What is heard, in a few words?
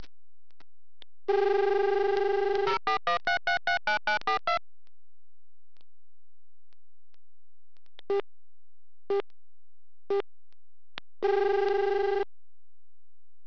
long ring